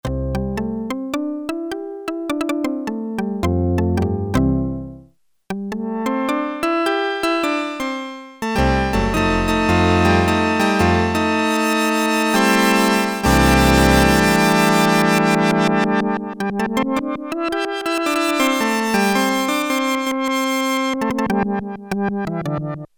Plucking With Brass, Prophet-5; Sequential Circuits Prophet-5 (Ver.3); Factory Patch; File 3 Programs

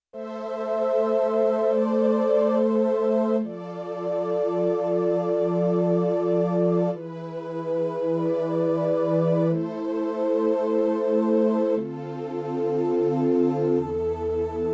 Voxstrings, Yamaha TX16W; Combo Performance 2

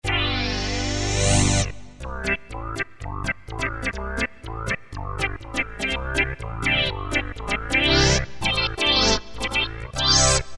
ChowaOrgan, Roland MKS-50; Factory examples

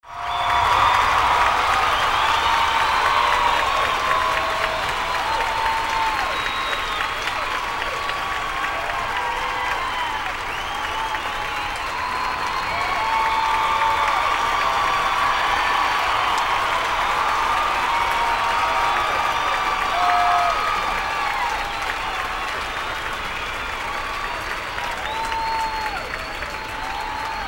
Crowd Applause; Apple GarageBand 2; Apple Loops

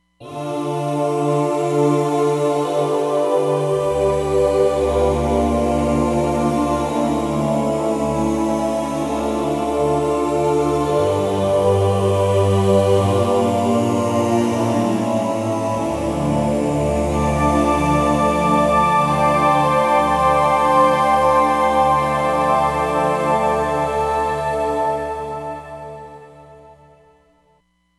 SiSi Choir, Roland Fantom XR

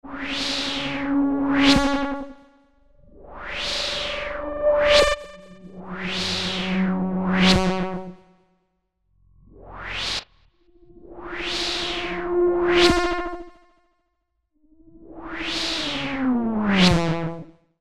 Swell, Swells; Green Oak Crystal

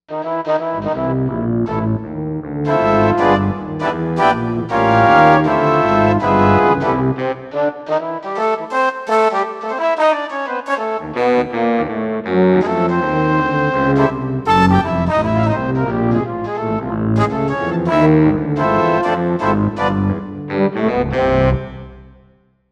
Jazz Band, Korg M1Rex; Factory Patches

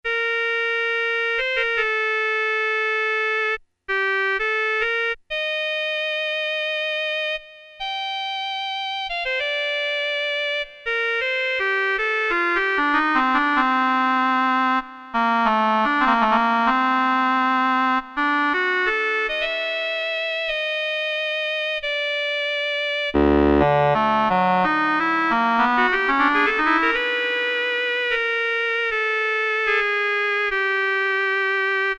oboe, Casio MT-68 preset sounds

